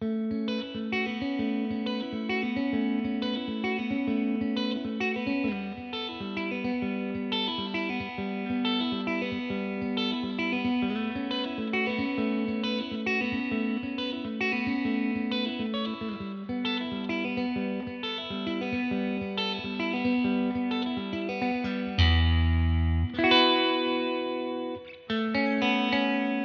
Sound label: Clean Inter Neck, guitares; Égérie kit micros HepCat Broadcaster position intermédiaire puis manche